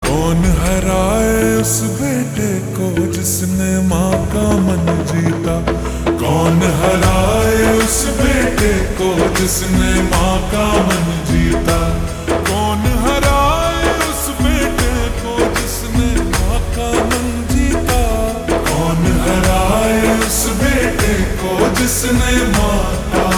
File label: Ringtone Slowed Reverb